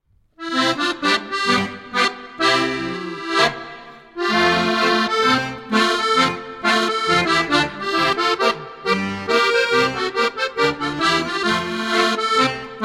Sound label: kolo, instrumental